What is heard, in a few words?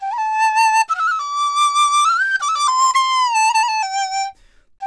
DIZU, 90; INIS - Opium; DIZU 100-C